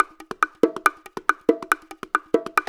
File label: BONGO 140